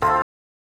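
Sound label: synth electro 140 A; Techno; Keys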